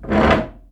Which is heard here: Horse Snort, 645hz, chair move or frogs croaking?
chair move